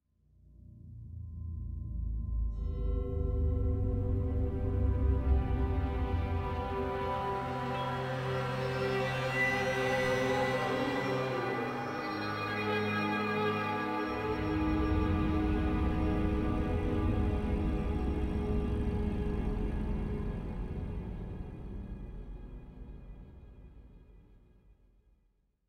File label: Spooky; Arizona